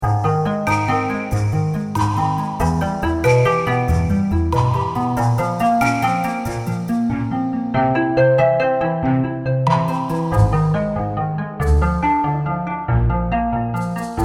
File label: orcs theme mysterious caves